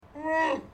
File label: Whew; Bowdaar